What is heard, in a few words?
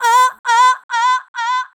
Processed Vocal